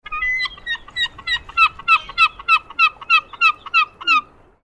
herring gull

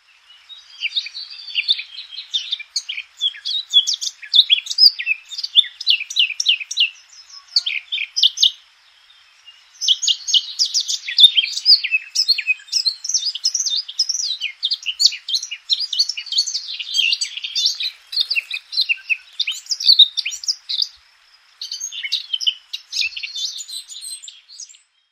blackcap

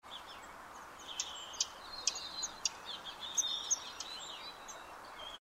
dusky warbler

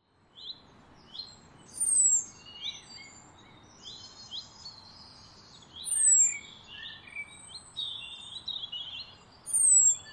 blackbird

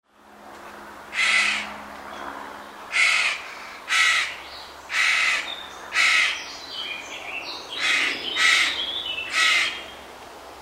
carrion crow

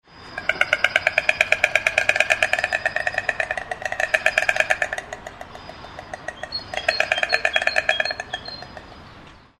white stork

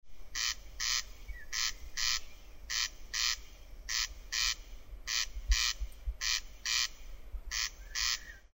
corncrake

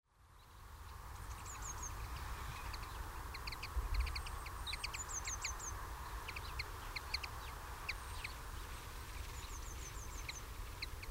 linnet

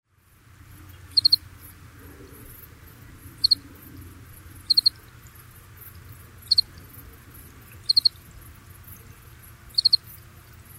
house cricket

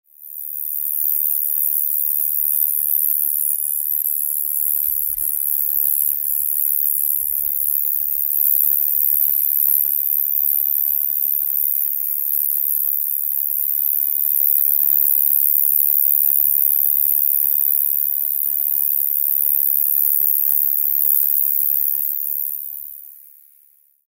large conehead

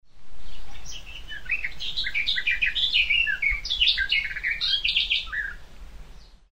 barred warbler

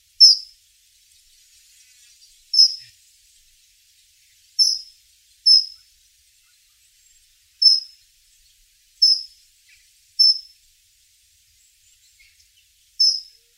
dunnock

